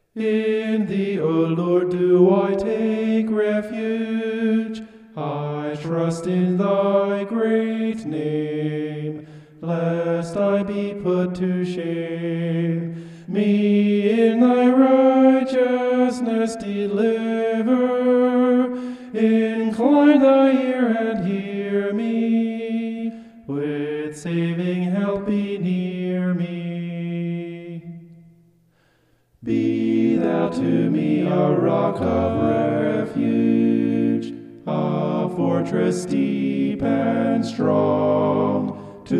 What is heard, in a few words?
vocal homoph; psalm 71